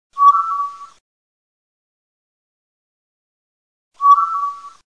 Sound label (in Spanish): GALLINA AZUL